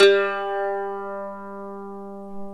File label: MANDOLIN